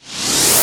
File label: Sweeps Short